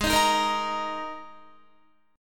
Ab Suspended 2nd Guitar; strummed; Absus2

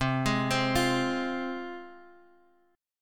C Suspended 4th Sharp 5th C; arpeggiated; Csus4#5; 8,11,10,10,x,x

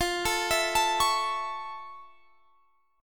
F Augmented Major 7th F Guitar Drop D

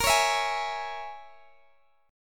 A sharp Minor 7th Flat 5th, Guitar, Open G

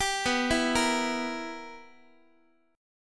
B Major 7th Suspended 4th Sharp 5th, Guitar chord; arpeggiated; x,x,10,9,8,9; 4A,4E,5A,5D,5G#,6C#; JGuitar

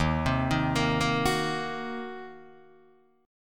D sharp Minor 6th D sharp, down, Guitar, Open D

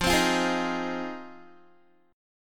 F Minor 7th F Guitar; strummed

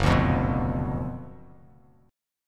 F sharp Major 7th Suspended 4th Sharp 5th; strummed; 2,5,3,4

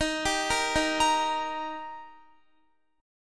D sharp Major D sharp Guitar, chord; arpeggiated; x,18,17,15,15,17; 4E,4A,5D,5G,5C,6F; chord shape

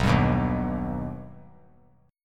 B Augmented B, chord; strummed; JGuitar

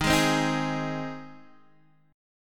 G sharp Major D sharp, guitar chord

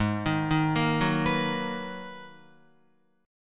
Ab Minor 9th Ab, Guitar; arpeggiated; JGuitar

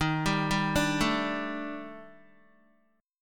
D sharp Major 7th Flat 5th D sharp Guitar, chord; arpeggiated; 10,10,7,7,9,x; 4F,4A,5C,5G,5C,6E; JGuitar

